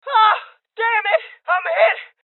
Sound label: Injury Male Young